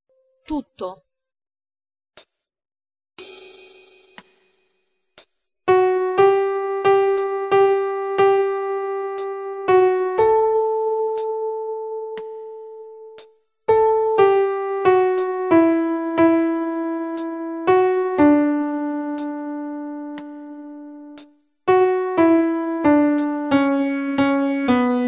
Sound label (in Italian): terzine 4/4 D